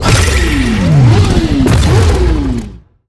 Motorcycle summon; Warframe; Vehicles; Motorcycle Ninety Nine

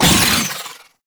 Entangle Cast Vocal; Warframe; Oraxia

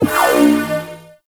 Shot Layer, pistol; Warframe; Corpus; Blastor Pistol; BE Plinx

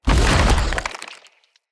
Root Spider Mother Foley Jump

